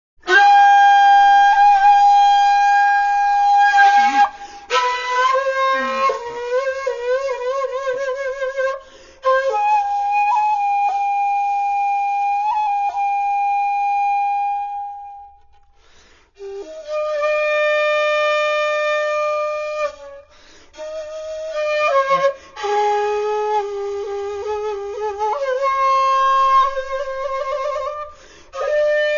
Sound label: yamagoe, shaku8